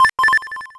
COLLECT MEGA; impact

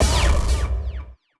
BALLBUSTER HIT

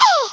JUMP; Worms; impact

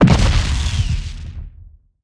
Break Sound Barrier; Bittrip

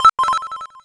COLLECT MEGA; impact

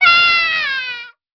fall

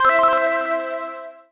COLLECT SUPER; impact

